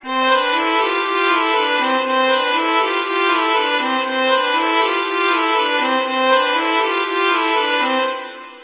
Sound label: faster